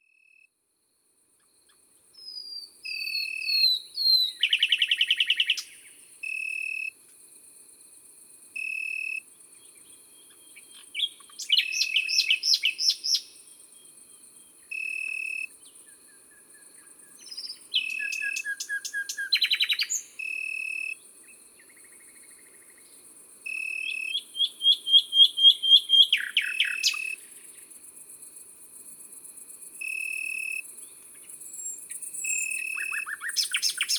Rossignol philomele et grillon d Italie Concerts Naturels de la Drome; Studio les Trois Becs

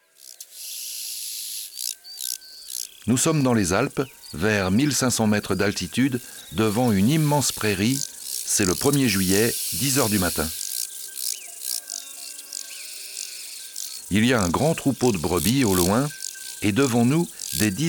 Arcyptere bariolee; ABC de la nature

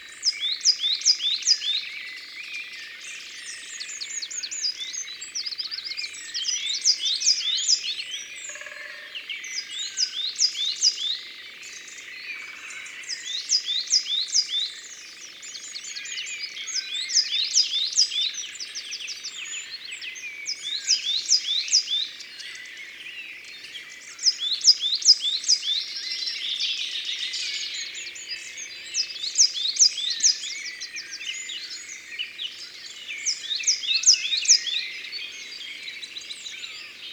Mesange noire; abc de la nature

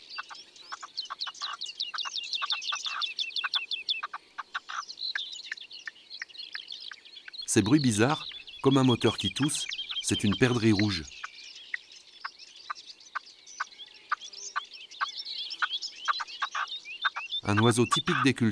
Perdrix rouge ABC des chants d'oiseaux

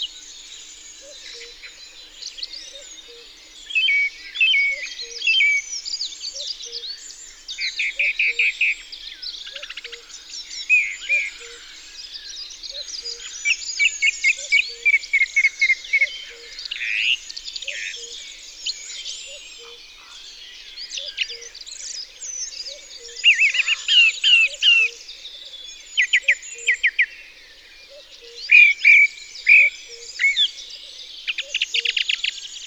Grive musicienne, Isere; Concerts naturels de l'Isere; Studio Les Trois Becs

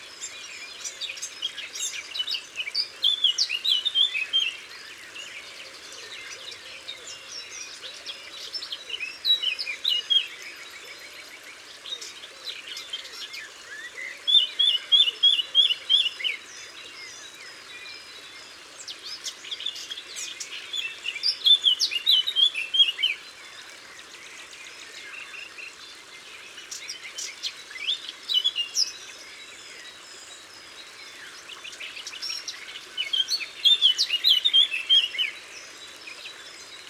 Fauvette a tete noire; Concerts naturels de l Isere; Studio Les Trois Becs

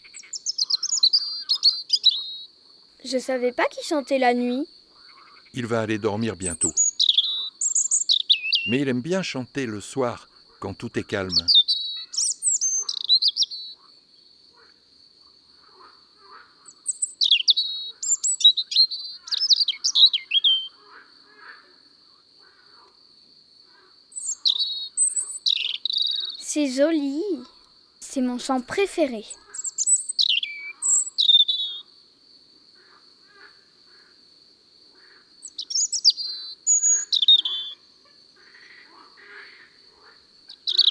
le rougegorge familier; cuicui et compagnie

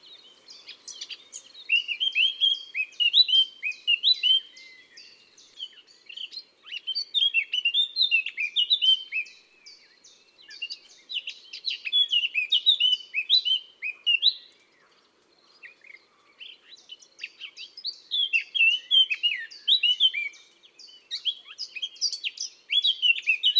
Fauvette a tete noire Chants d'oiseaux des bois et des forets

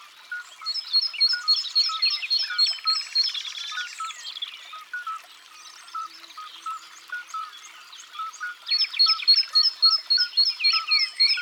Mesange charbonniere; abc de la nature

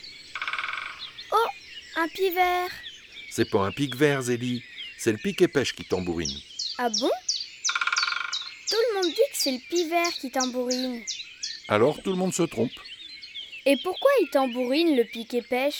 le pic epeiche; cuicui et compagnie

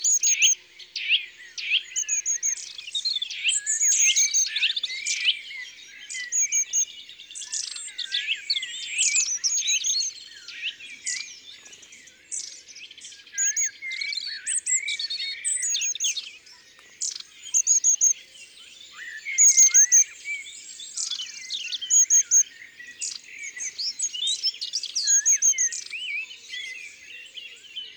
Mesange a longue queue, ABC des chants d'oiseaux

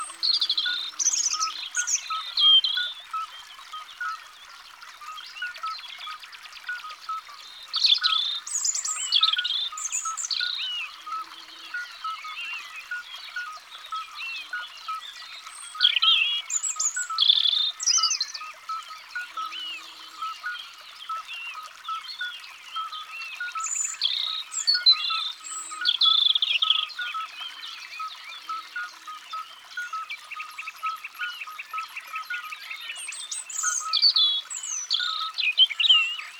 Rougegorge familier; abc de la nature

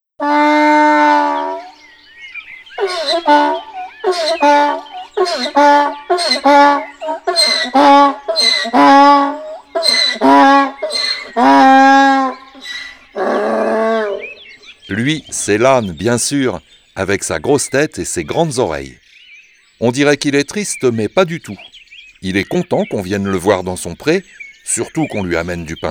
L ane, un jour a la ferme

